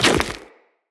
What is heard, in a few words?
Run Goo, Footsteps; Tenno